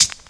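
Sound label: Closed Hi-Hat, Dance Drums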